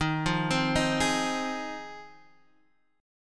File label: F Minor 7th, Eb